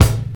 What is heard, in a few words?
crisp kick single shot a key, Royality free kick single hit tuned to the A note. Loud; kick drum samples